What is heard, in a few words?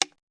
click; AtlanteCorpoUmano2